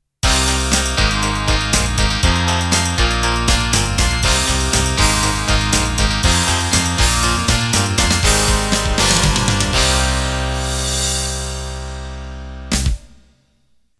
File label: Pop Rock End